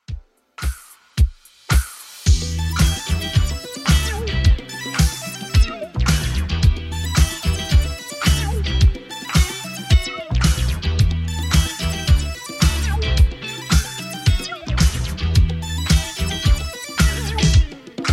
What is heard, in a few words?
80s Redrum 110bpm